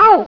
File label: Get Poison; Catacombs